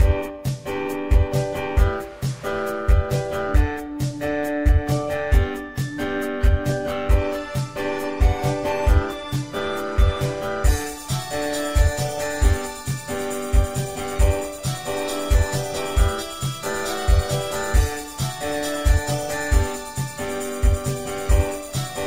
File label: Connect Motorola Original Ringtone